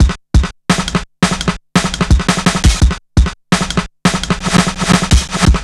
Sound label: Drum Bass, Drumloops, 170bpm